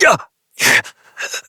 Death, Adult; New War; Operator

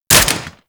Sniper Rifle Mech; Warframe; Grineer; Gorg

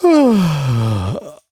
Med Sigh, Son, Vocalizations; Warframe; Deimos

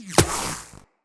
Shield Impact; Tenno

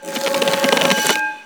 Choir Bow Charge; Warframe

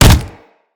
Generic Hit; Tenno

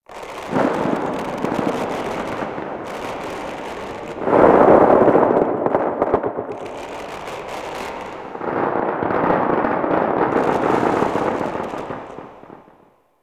Exterior Void Energy Crackle; Ambience; Warframe; Railjack

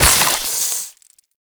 Modular Secondary Barrel Beam Attach, Weapons; SU Modular Guns; Barrels

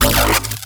Tazicor Updated Shot; Warframe; Moa Pet; Weapons

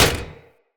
Short Sword Medium Impact Dmg Metal

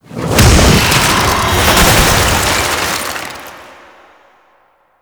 Frost Avalanche; Warframe

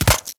Micro Land; Warframe; Corpus; Arachnoid Micro; Steps

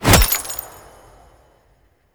Glass Shank Impact; Warframe

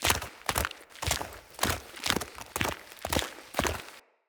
Horse Step Gallop Loop Dirt; Mendicant Horse; Foley; Footsteps

